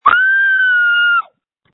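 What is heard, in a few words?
Scream, phone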